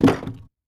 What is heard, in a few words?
shutter wood deploy; Rust